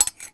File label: Metal Rattle Grab Tongs; Foley - Rattles